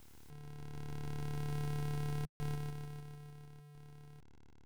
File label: Snore